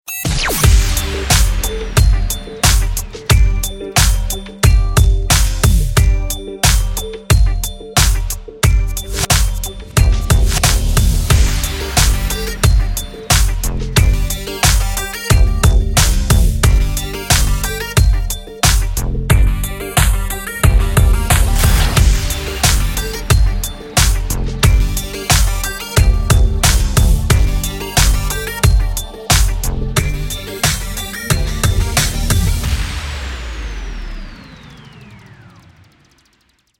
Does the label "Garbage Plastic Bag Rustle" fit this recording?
No